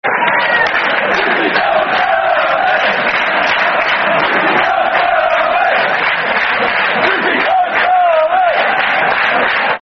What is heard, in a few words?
Football Chant (Soccer Songs), Fulham; Chrissy Colemans Black And White Army; Fulham Ffc